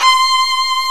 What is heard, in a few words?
Arco Violin C5; Proteus 2000; Digital Sound Factory Proteus 2000